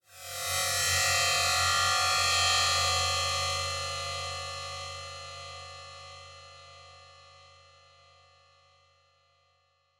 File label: Cyber